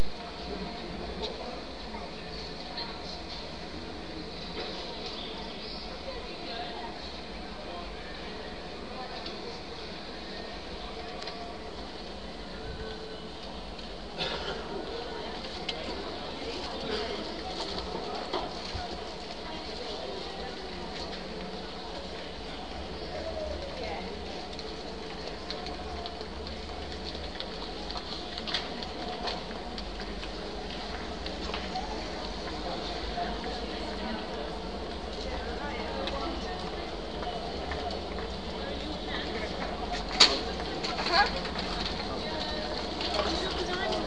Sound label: the soundscape just outside the old sports shop; kik sports shop